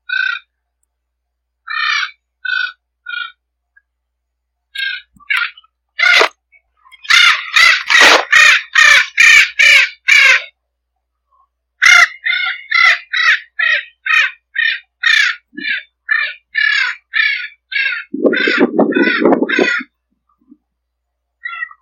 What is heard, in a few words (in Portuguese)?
canto da ararinha azul